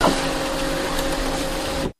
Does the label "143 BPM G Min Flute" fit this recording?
No